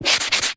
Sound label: office erasing rubbing out chalk blackboard